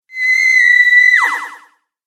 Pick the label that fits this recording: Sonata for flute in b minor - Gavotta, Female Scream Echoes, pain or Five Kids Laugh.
Female Scream Echoes